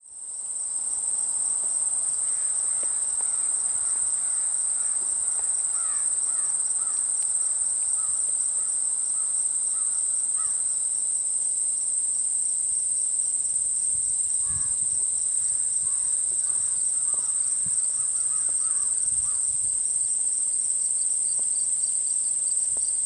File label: crickets and crows with Pops